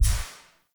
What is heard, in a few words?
Grab Button Press; Enemy; Mockeries; Abilities; Mockery